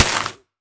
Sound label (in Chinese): 沾泥的 红树根 破坏声